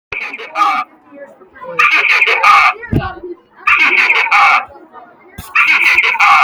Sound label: hehehahaaaa